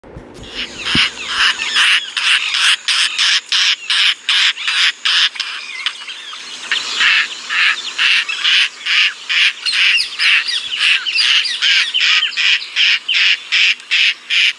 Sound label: Indian roller